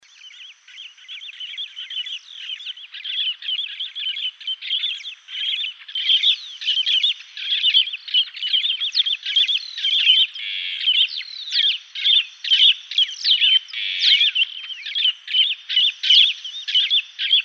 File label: Pseudoleistes guirahuro flight; Yaguarete Forest